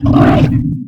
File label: attack; CosmicRage; combat; creatures; alien